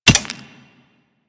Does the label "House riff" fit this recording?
No